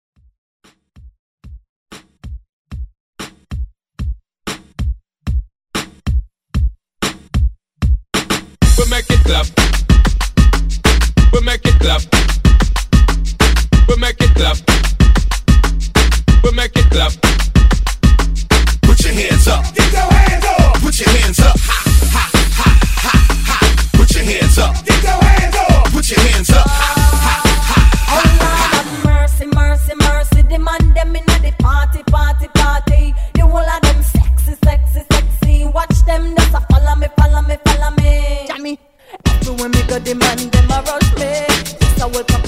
Reggae Redrum

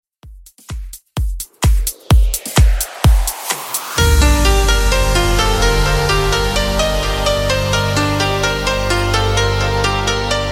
Top40 Redrum 128 Bpm Dirty

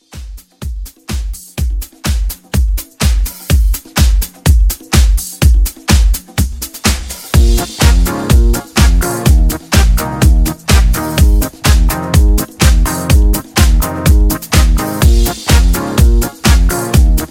house, 125; House Re-Drum

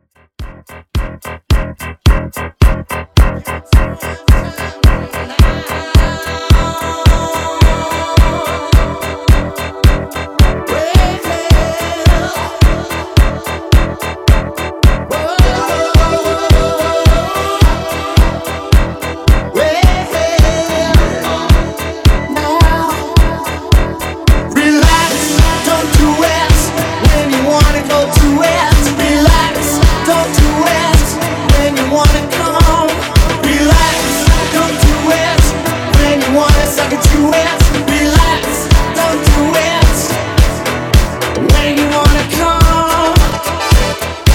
80s Redrum

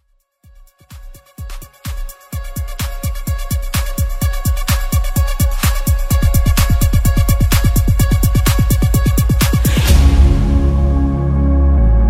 Festival MashUp

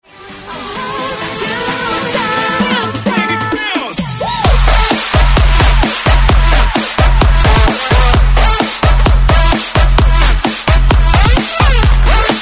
130Bpm BREAKMIX

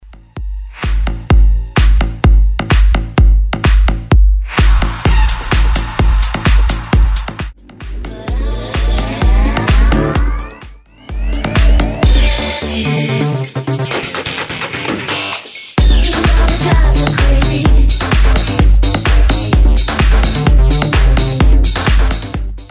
Bootleg Electro 128bpm

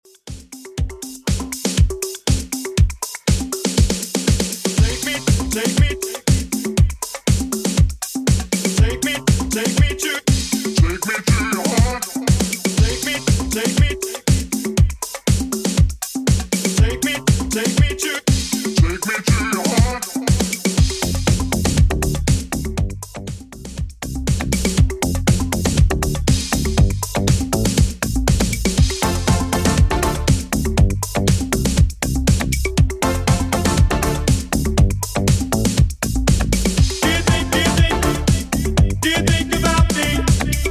90s Redrum 120bpm

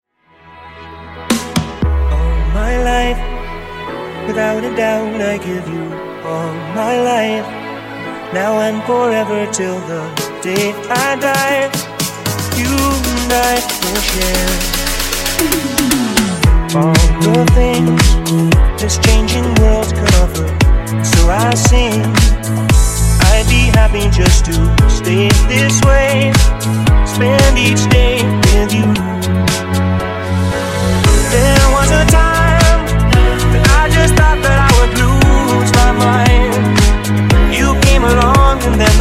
Funk Bootleg 115 Bpm

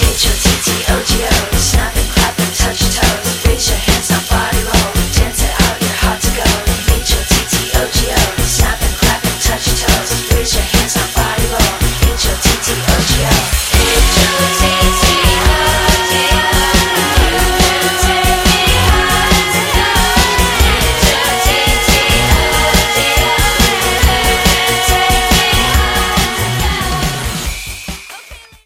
140bpm F

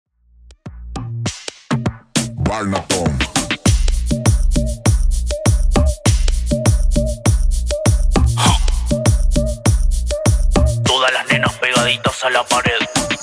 Moombahton Extend Intro 100bpm

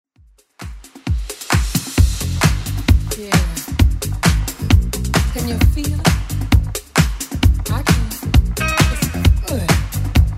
80s Club Edit 132bpm

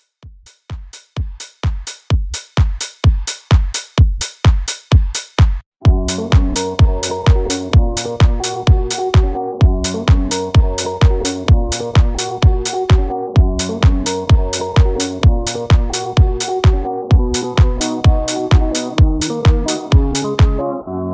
Extended Mix 128Bpm; djyan

